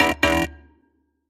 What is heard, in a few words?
wrong